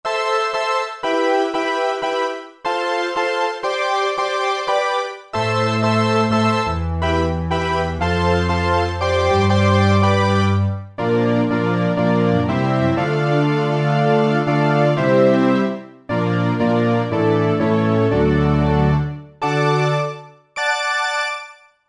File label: JUNO Power, Roland JV-2080